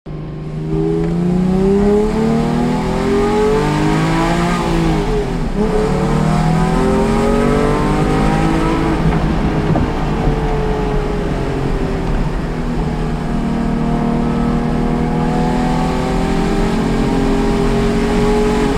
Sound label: Sounds roundabout; Compare 911 GTS Generations: 997 vs 991.1 vs 991.2 vs 992